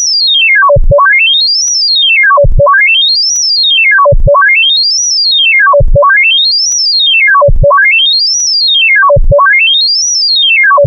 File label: Up Down Fast Round Sound